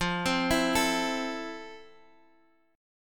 F Major 7th Guitar Open D, chord; 15,15,14,15,x,x; down; chord shape

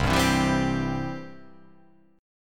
C Major

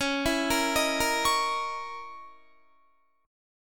Db Minor 6th Add 9th Db down Guitar; arpeggiated

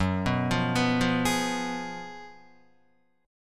Gb Major 7th Suspended 2nd Suspended 4th, Guitar; GbM7sus2sus4 arpeggiated; JGuitar

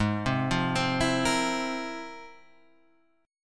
F Minor Major 11th

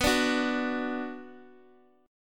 B Minor B

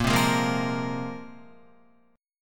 Bb 7th Suspended 2nd Bb down Guitar; Bb7sus2 strummed

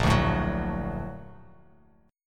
Bb Augmented Major 7th Bb, Bass Standard; Bb+M7 strummed; JGuitar

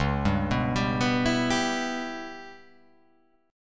C sharp Minor 7th Flat 5th C sharp

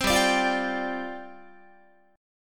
G 7th Suspended 4th G down Guitar

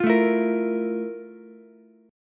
F Major 11th Ukulele C6; strummed; JGuitar

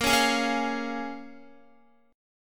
Bb-Minor 7th-Bb Guitar; Bbm7 strummed

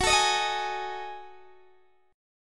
F sharp Minor 6th Add 9th, Guitar DADGAD; chordshape; down

